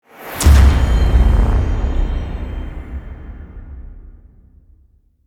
Hit Swell; Ninja Tracks

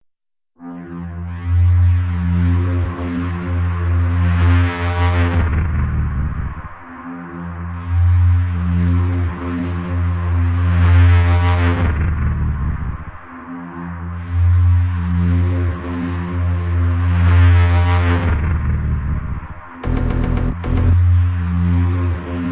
Pestilence No Drums; Ninja Tracks

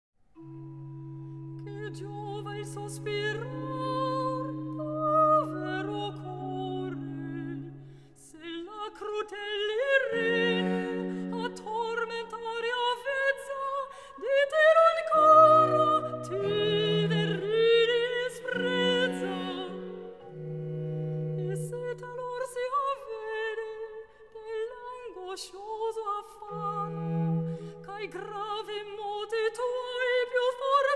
Cantata for Soprano solo, Recita; Che giova il sospirar; Recitativo; Antonio Lucio Vivaldi

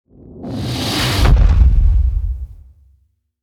Hit Deep; Ninja Tracks

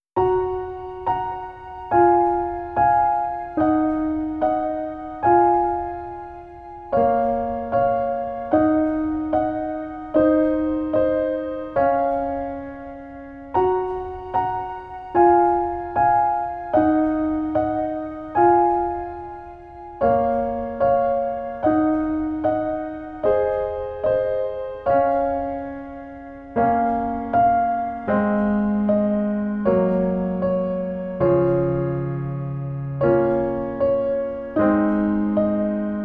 Piano, Happy Lullaby

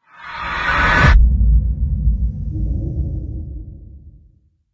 Rumbler Wipe Wipe

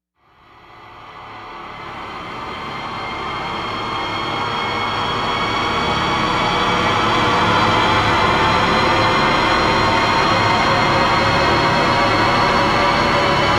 Rise; Ninja Tracks

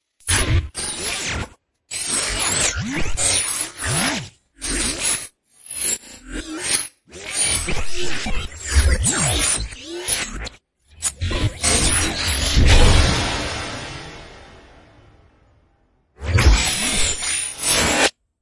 Storms Swarm; Gothic Storm Music; Advanced Sound Design

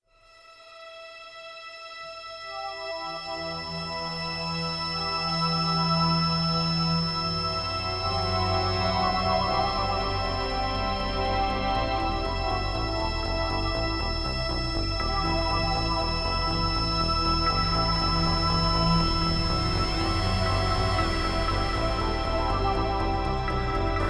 Despair Ambient

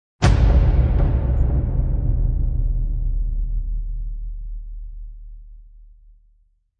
Twin Slammer; Gothic Storm Music

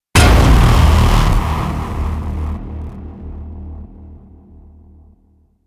Hit Epic; Ninja Tracks

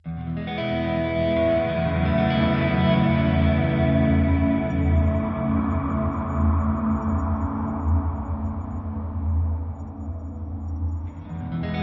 Icelandic Chill, drone; Gothic Storm Music

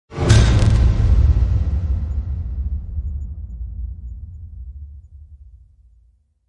Blast Radius Smash, Sound Design Trailer Tools; Gothic Storm Music; Brain Warp

